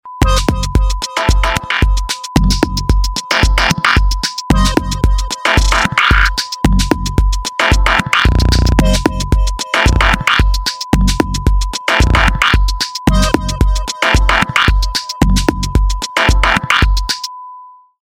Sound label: air groove